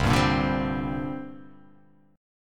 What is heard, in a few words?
C 7th Suspended 2nd C down